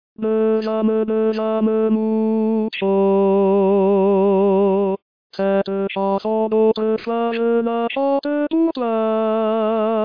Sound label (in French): Tenor